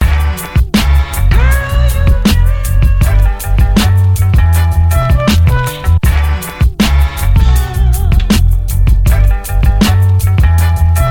beat

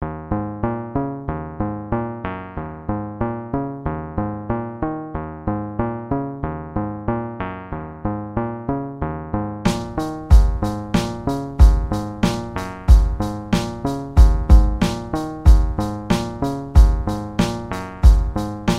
beat

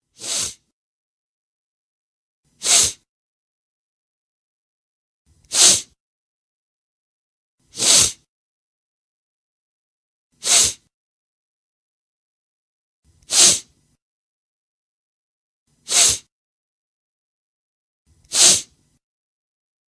Secret Movie Theater Sniff Ringtone; Cell Phone

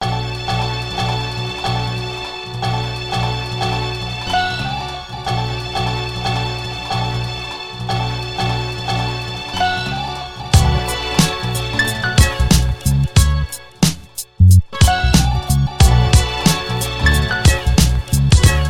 beat

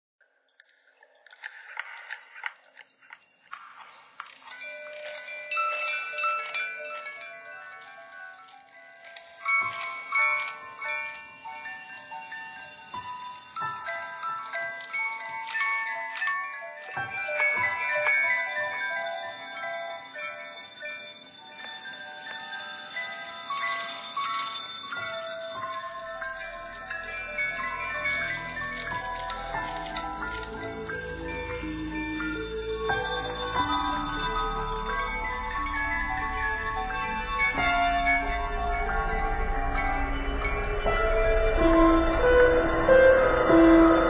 Horror Music Box Theme

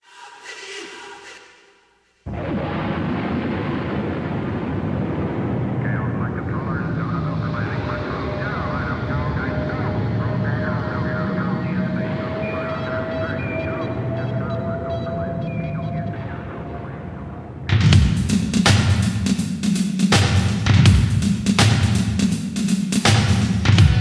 Backing Track

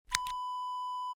scissors snip; Scissors Snip Wav Sound Effect

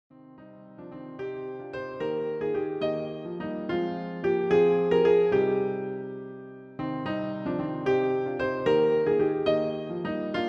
Traditional English; All hayle to the dayes; arr. Keyte and Parrot

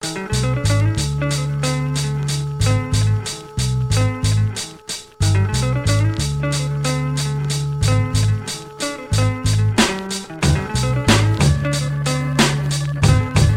beat

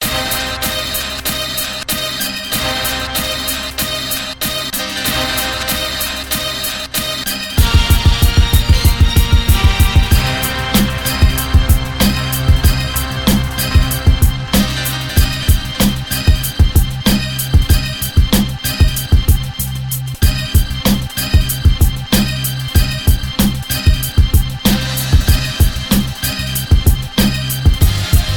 beat

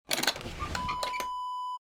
drawer dresser open; Dresser Drawer Open Wav Sound Effect

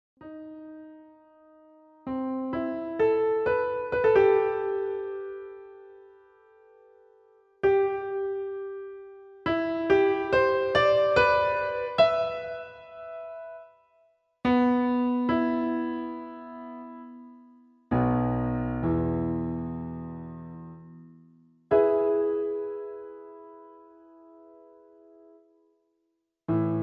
piano transcription of the song; Solvejg s Song Op 52 No 4

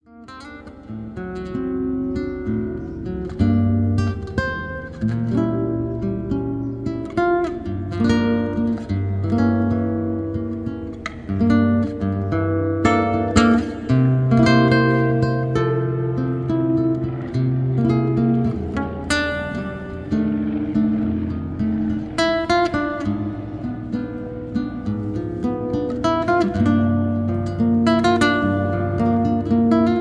Guitar Music

